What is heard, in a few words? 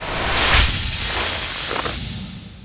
frost armor; weapons; dota; lich